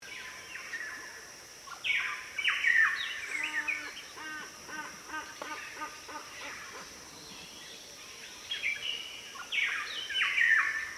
Ethiopian cloud forest Dawn chorus

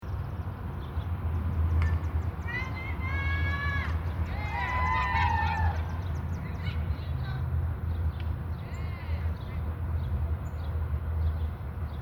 Baseball across from St. Marys Church at sunset

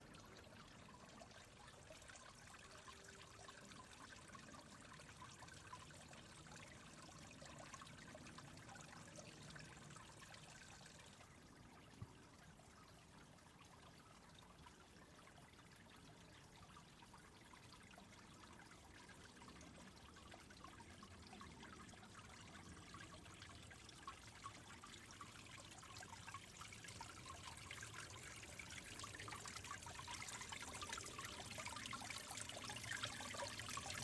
Autumn Equinox Field Recording; Talia Randall

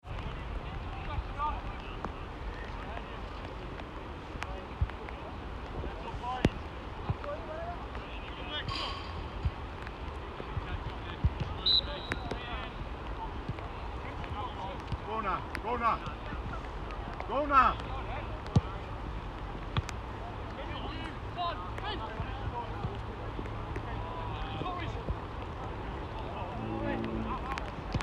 Sunday afternoon football in the rain

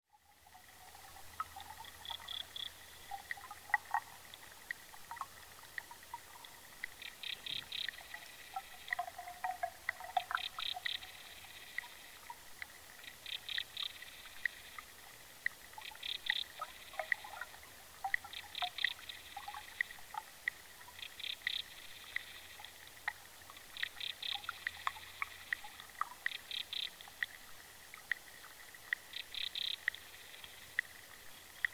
Hydrophone

